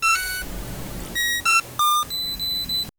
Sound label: futurustic biolab sci fi